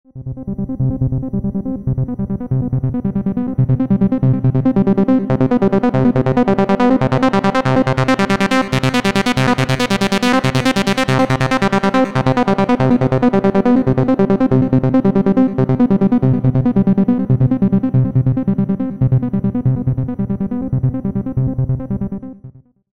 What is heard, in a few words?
Melody; SIDizer